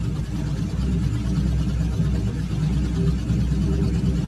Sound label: Idle Loop; The Loud House - Extreme Cardboard Racing; NextlevelGames